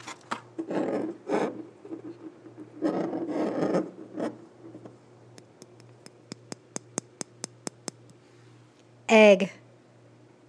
egg; sound semiotics of the kitchen

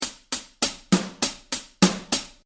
drum groove

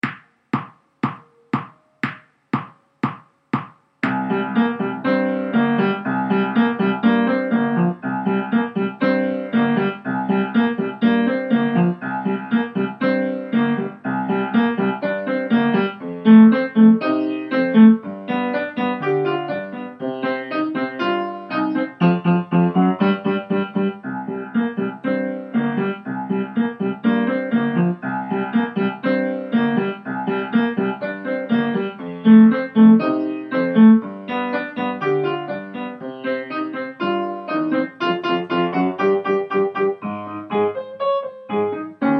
circus rock piano

